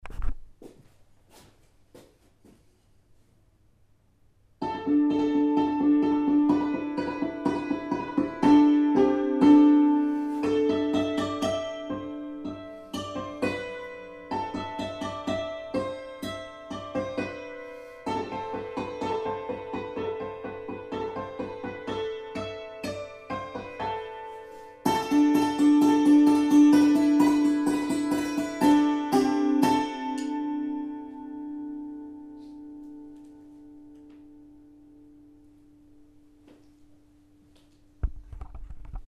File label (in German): Hackbrett